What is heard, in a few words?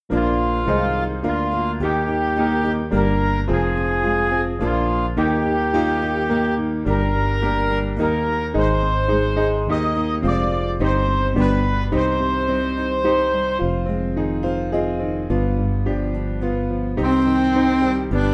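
Music Backing Files for Churches; 2/Cm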